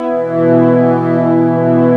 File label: HOUCEY CHORD